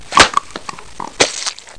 SPLAT

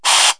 regulatr, air; KABOOM! - Wild and Wacky Sound Software; industry

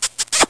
KISS

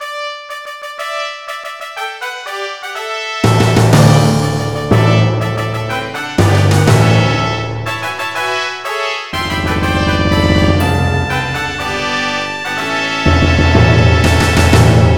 FANFARE; MIDI Music File

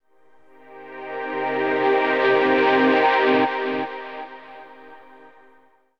SYNTHPAD AMBNT 160 C; eJay; Ambient; Sphere

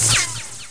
laser all; Psycha

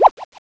zoom; Carom

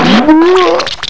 MOO DEATH; Carmageddon Demo 1.0

